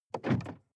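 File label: sports car interior trunk open soft; verkehr transport; autos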